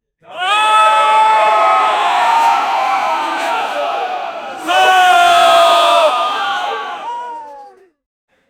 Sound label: people shouting "hey hey" h